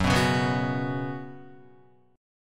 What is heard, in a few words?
Gb Suspended 4th Gb, down, Guitar; strummed